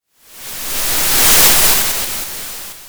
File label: Sound of waves crashing on a shore